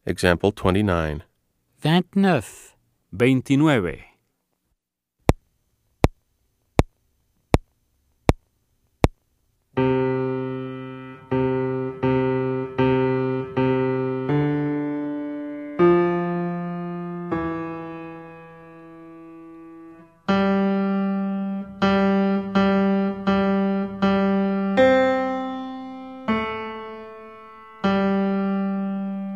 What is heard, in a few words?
Keyboard